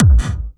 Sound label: Kick